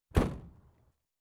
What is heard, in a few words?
Fire Engine, Door Slammed; Emergency; BBC Sound Effects Library